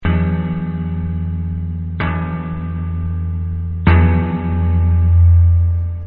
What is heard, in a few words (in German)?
glocke